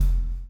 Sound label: CHAIN; Spectrasonics - Backbeat